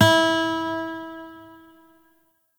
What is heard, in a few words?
Shawzin Oct Two E; Warframe; Lotus Shawzin